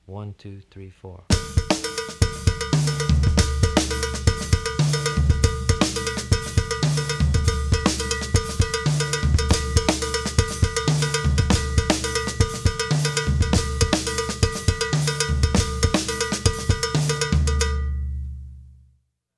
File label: mozambique variations